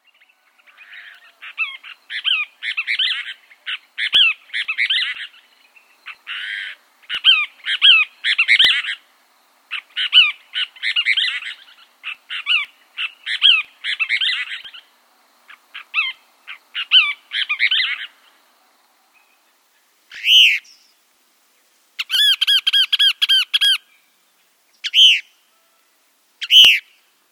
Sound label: Cassins Kingbird